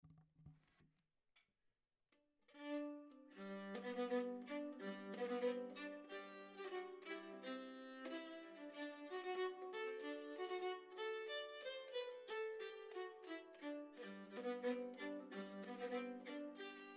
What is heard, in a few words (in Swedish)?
Polka; Röros